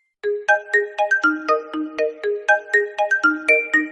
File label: notification